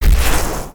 projectile impact; Jakiro

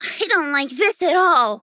Taking Damage

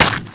Dire creep footstep; Dota 2

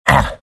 pain; beastmaster

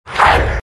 big snarl; Undying

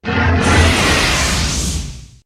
Dual Breath; Dota 2; Jakiro

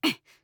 Grunt Fast; Realm Royale; Hunter

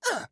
Mech Grunt Jump; Paladins

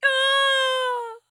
Falling; paladins; Seris

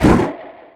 projectile launch; Enigma

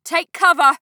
Taking Damage; Realm Royale; Hunter; Realm Ops

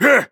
Grunt Attack; Knight; Paladins

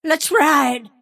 move pain; Snapfire

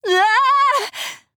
Falling; Princess

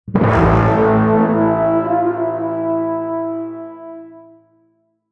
Music default stinger

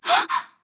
Surprised

